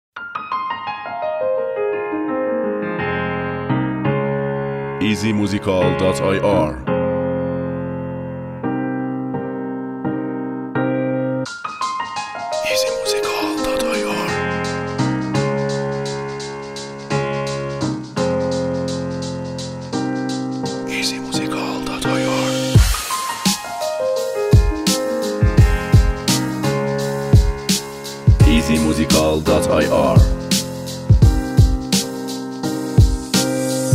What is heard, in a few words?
Beat